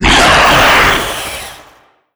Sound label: spawn, antlion; creatures; vox